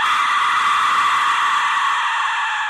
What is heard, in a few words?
aztec death whistle